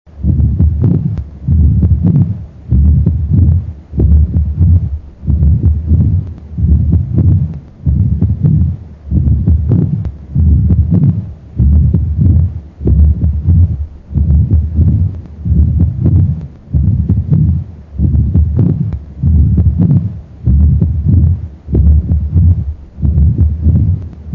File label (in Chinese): 二尖瓣狭窄伴关闭不全